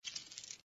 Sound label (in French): fiche collect